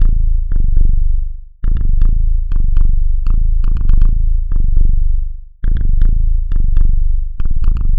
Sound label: Bass Line; Eclectic Electronica